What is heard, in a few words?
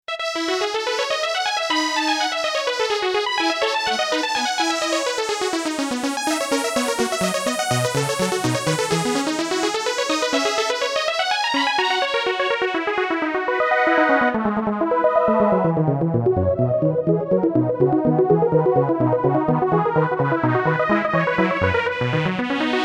VA1 Detuned Saws; PC3